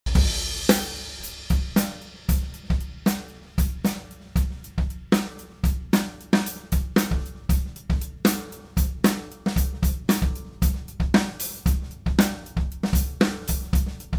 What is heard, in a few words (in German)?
C414 OH solo ganzes Set; C414 - nur Overheads - ganzes Set - mastered; Glyn-Johns